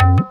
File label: Tabla Hit; Drum One Shots; Percussion; Ambient Grooves